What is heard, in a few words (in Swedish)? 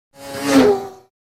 carby, racerbil